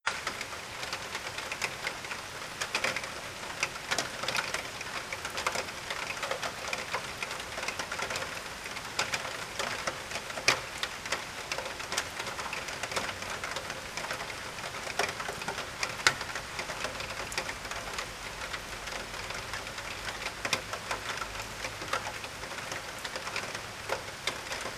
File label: Shower Raindrops